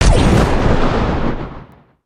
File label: Rocket Impact